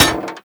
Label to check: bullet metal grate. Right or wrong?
Right